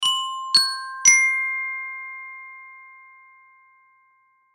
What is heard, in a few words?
Xylophone Melody, Xylophone Melody Short; Mallet; Blastwave